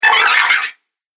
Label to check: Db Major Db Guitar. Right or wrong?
Wrong